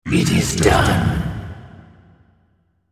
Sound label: attack; Malzahar